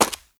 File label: Steps